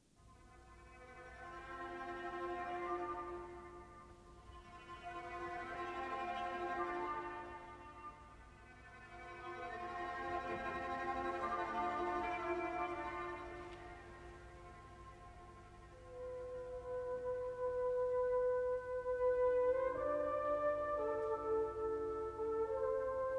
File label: mazurka in a minor; chopin; op.17 no.4